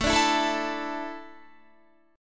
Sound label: A Suspended 4th, Guitar; Asus4; strummed